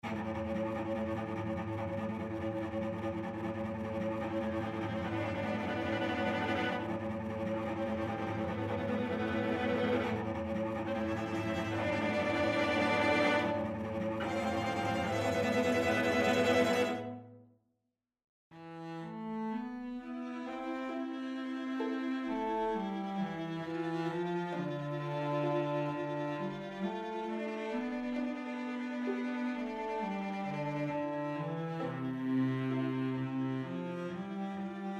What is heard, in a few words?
Moulin Rouge Overture; Cancan